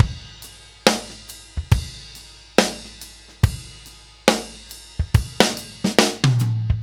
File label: Ballad Loop, Drum, 70s Ballad; Drum Tools